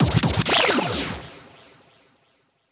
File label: Pearl Disc Scratch; Side Order SFX